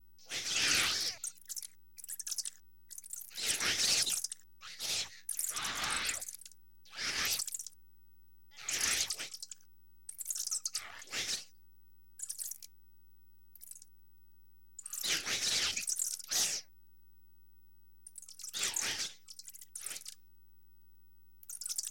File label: Ganglion Eater, Vocals Humanoid; Sony Pictures Sound Effects Series Volumes 1-10; Sony Creative Software